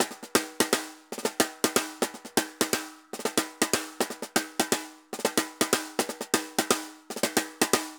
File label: Tambor Bomba 120, Drums & Percussion, 120 bpm; Best Service; Festa Latina VOL-1